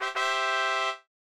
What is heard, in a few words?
Hypermaze Success Trumpet